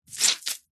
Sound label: cleaning cloth soaking wet squeeze squelch; household